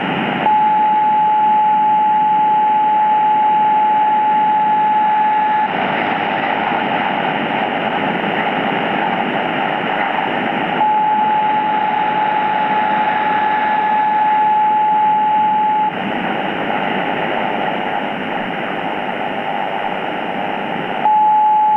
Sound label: Radio Signal Beep 859hz AM